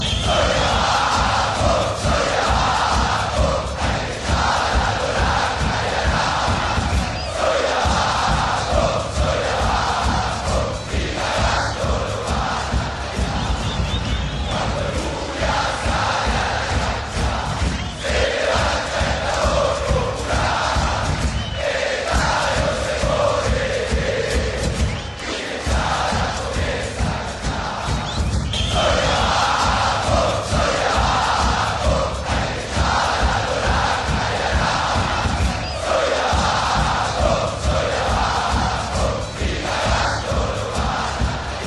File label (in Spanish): himno, chants; lda